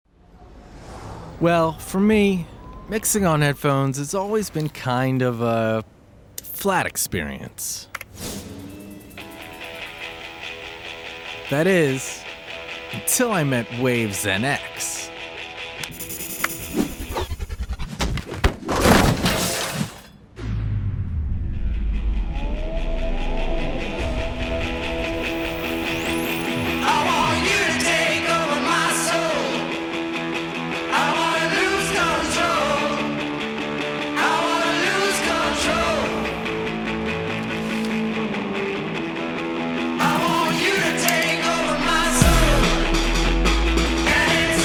waves

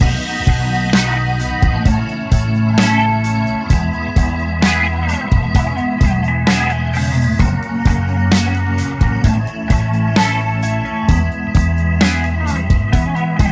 slow

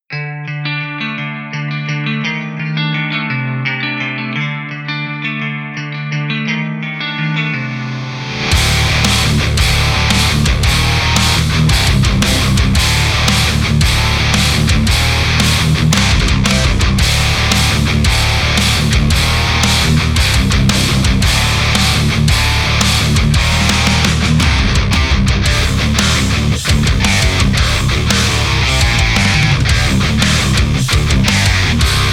Darkness Drop Tuning; Electri6ity

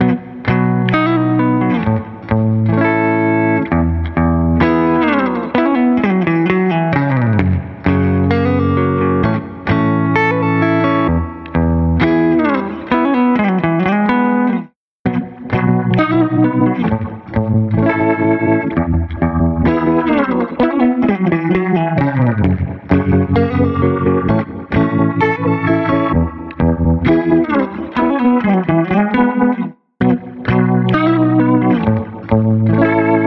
guitar dry wet

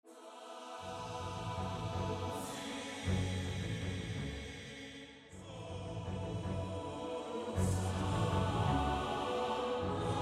alto bass

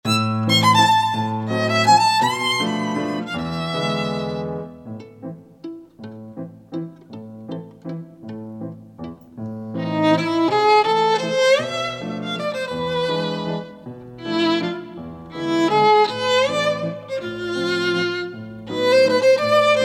violin

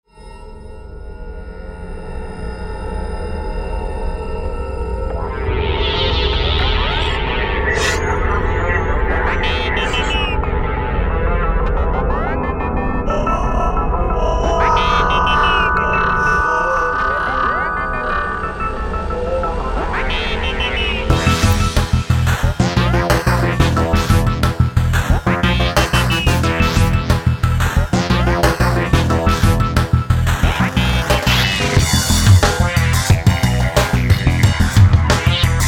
psybient

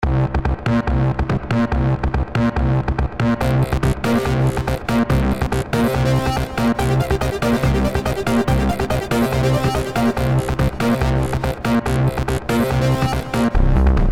hard trance

